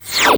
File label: DOPPLE WHIZ, FLY-BYS; Spectrasonic Distorted Reality 1